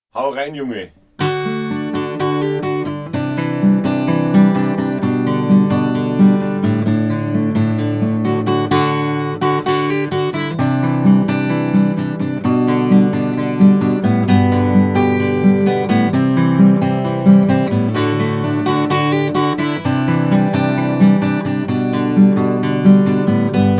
Gitarre; Apogee MIC

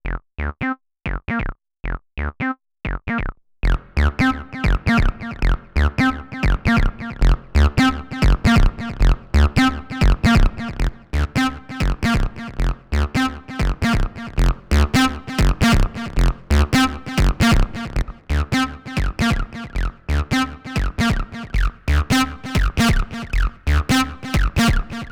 analog heat fx acid beispiel alle character distortion modi

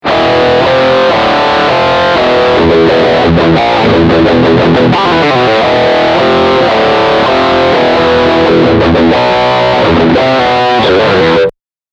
Riff Steg Humbucker Distortion

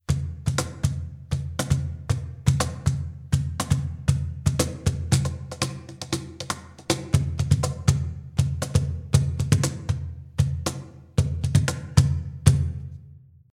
Cajon Groove Cajon mit dynamischen Mikros MD241 und SM 57 nachbearbeitet

